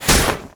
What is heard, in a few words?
Spear cloth, Damage